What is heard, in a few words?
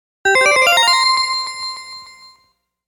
Coin